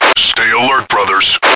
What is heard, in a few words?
alert; slender; chaosinsugunner